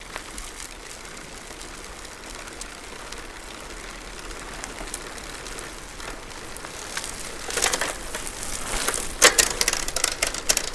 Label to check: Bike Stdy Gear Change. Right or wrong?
Right